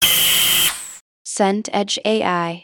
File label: truck air brake